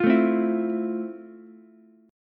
F Major 7th F, Ukulele; strummed; JGuitar

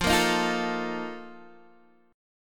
Bb Major 7th Suspended 4th, F, chord

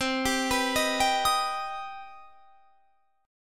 C Minor Major 7th C; CmM7; arpeggiated

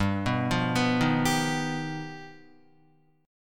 G Augmented 7th, Guitar; G+7 arpeggiated; JGuitar

